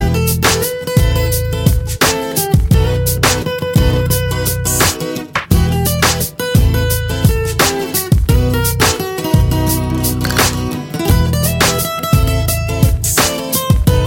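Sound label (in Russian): хорошая гитарная мелодия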